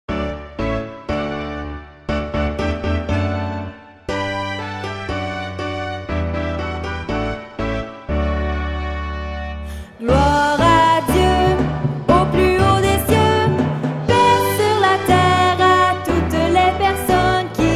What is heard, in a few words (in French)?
Gloire a Dieu (adultes)